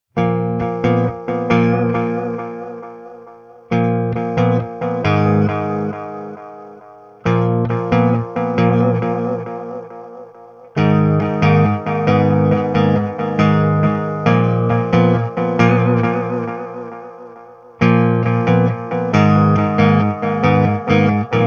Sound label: minor in piano